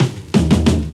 fills; Sound & Vision - Gigapack I

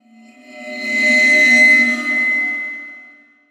Waterphone Shine; Sonic Foundry (Sony Creative Software); The Electronic Music Manuscript Collection

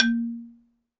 XYLOPHONE, Percussion; ILIO

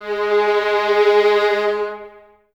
Violins Detache, G#3; String Ensembles; Miroslav Vitous; 11 Violins

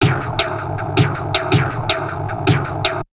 DIST, Drum Loops Crazy Processed

